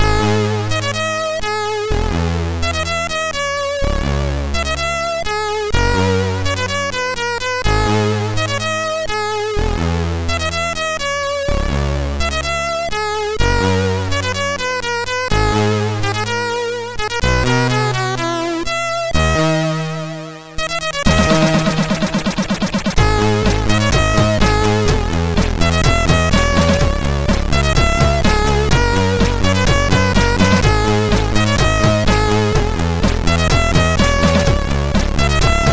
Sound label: MOS6581R4, sid